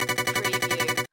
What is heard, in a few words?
Casino Slot Machine Spinning Wheels Loop; one armed bandit